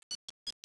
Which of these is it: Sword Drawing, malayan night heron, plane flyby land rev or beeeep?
beeeep